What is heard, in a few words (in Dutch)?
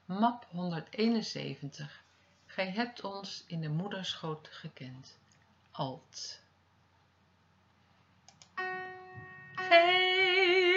alt zang; Gij hebt ons in de moederschoot gekend